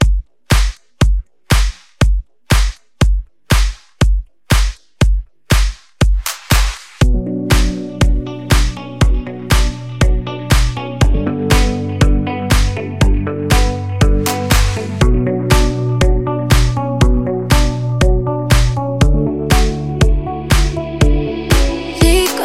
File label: Tiktok Dance Mashup; Remixfreakz